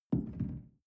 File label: shell place on tablecloth soft; soundpool